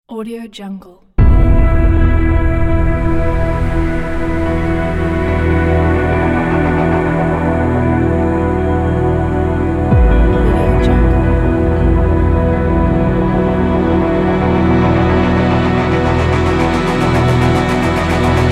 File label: ambient cinematic atmospheric